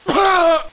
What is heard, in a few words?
death; cstrike; codlife